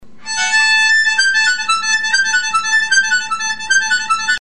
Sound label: tongue switch with, harmonica